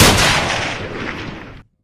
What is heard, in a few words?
gunshot; osu